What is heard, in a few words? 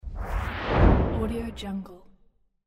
whoosh evolution